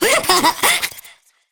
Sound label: Girl Idle D; Warframe; Zariman; Skitter Girl